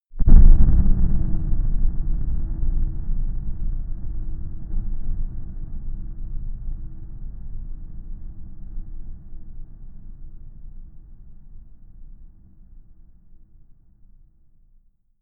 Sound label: crushed boom, impacts; hits explosionen